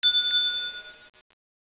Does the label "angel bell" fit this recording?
Yes